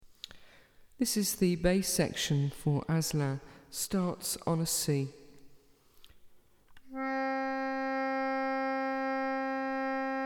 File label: bass; Asla